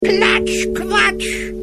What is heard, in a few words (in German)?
platsch quatsch